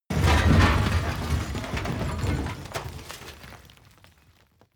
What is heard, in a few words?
explosion large shrapnel dirt; explosionen; comedy-cartoon; soundpool